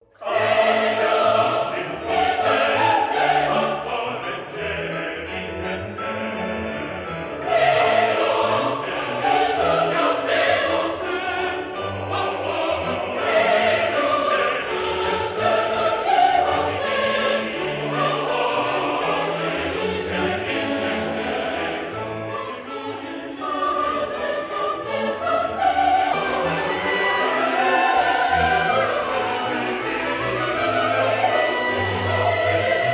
Chamber Orchestra Chorus

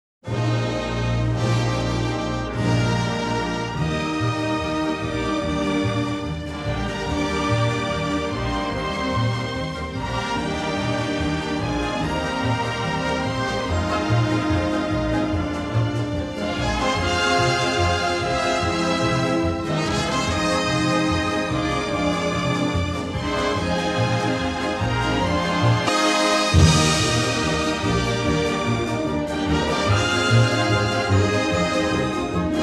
Instrumental

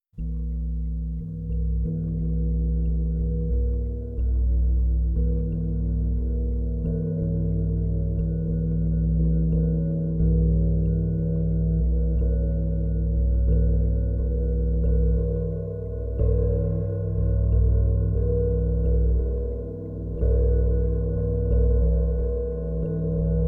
Stereo Music for Hi Hat

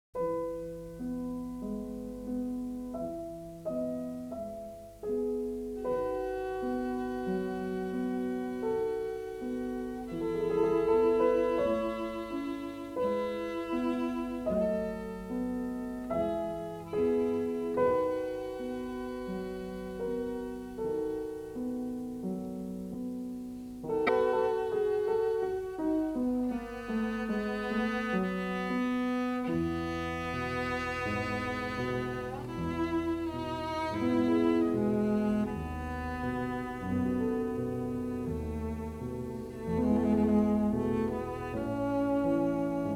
Cello Sonata No 3 in A Major Adagio cantabile

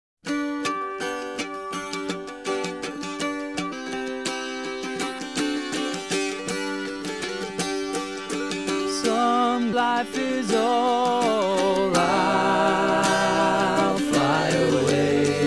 Gregorian Chant

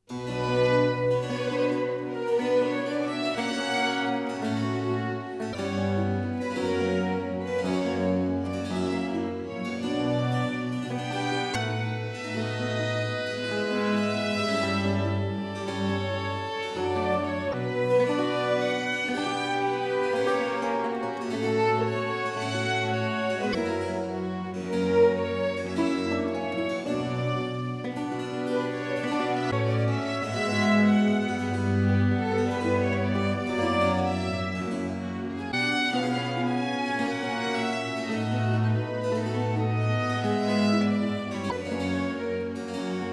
Sinfonia in Do Maggiore Symphony in C Major

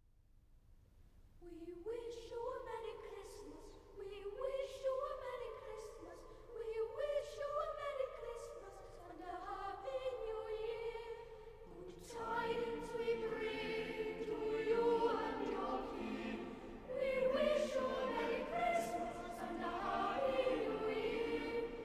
Cathedral Choir We Wish You a Merry Christmas

